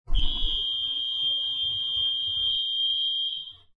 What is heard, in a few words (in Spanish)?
alarmas sismo; reconoce los sonidos